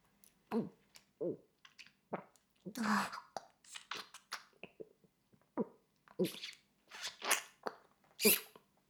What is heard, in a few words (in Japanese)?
フェラ音声; 牧場戦腺; 少尉